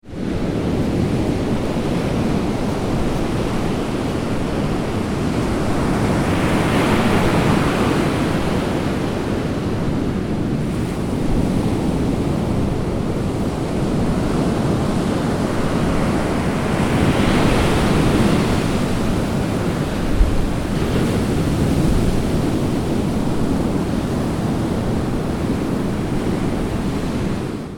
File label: surf